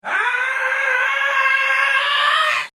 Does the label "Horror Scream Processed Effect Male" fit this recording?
Yes